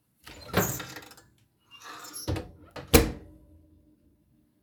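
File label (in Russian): открывание и закрывание духового шкафа на кухне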